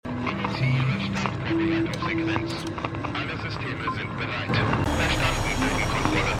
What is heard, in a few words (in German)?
Flugroboter; Hello Tomorrow